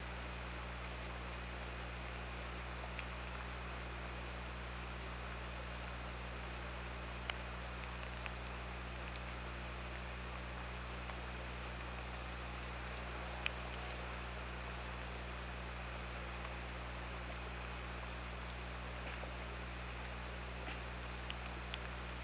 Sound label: OrcaLab